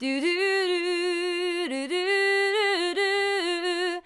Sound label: Female vocals and robo-vox